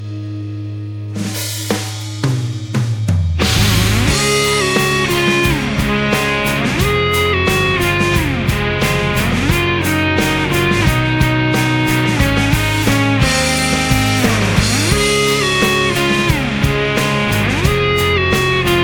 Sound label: BGM City pop in Japanese